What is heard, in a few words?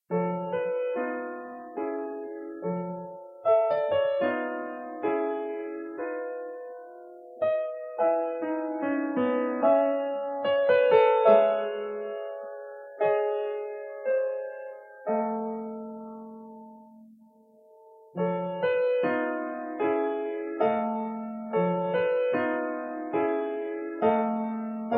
pieces for piano